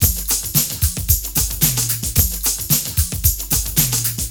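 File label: drum loops, right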